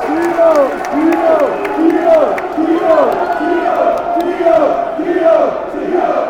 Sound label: song, fan chants; andy keogh; mfc